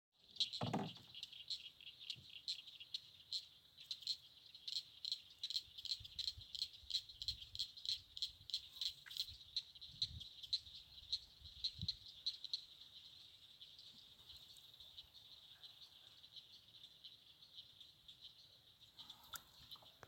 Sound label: Frogs; Mile 11.5